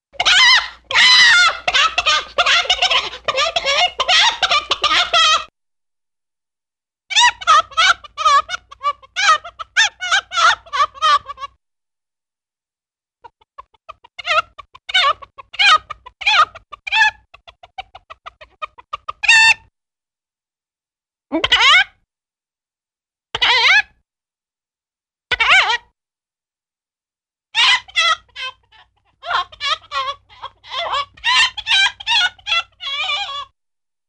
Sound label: Funny Single Chicken Squawks, Cartoon; Hollywood Edge; Cartoon Trax